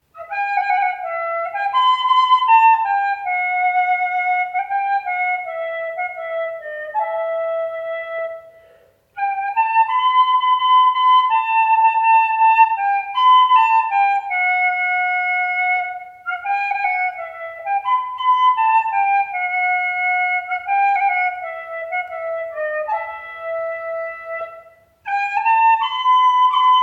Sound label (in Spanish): Flauta; deth comenge; era flauta